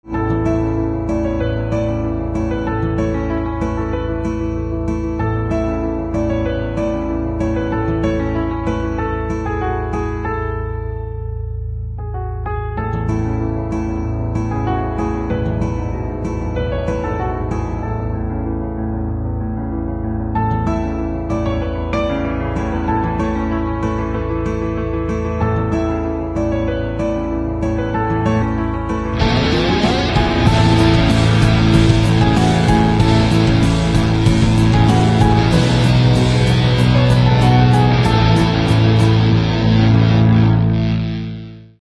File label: Piano with Guitar Instrumental Ringtone; devotional instrumental ringtone